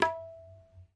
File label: Ta, Tabla; ETHNIC and WORLD PERCUSSION; Tabla Kit Large